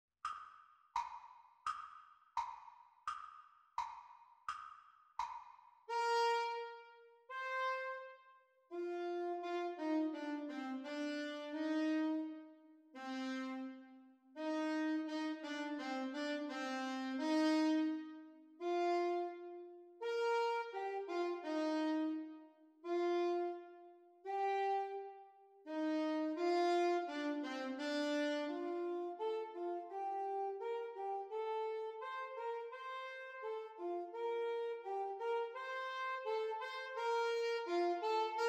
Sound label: Sax Duets Playalong; Alto Saxophone duets; Exam Speed; Grade 2; The Teacher part